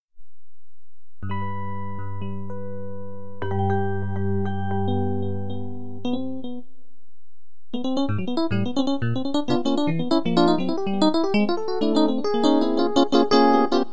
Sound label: one of my jazzy improvisations; is a recording using arecordmidi of one of my jazzy improvisations, this one for patches 5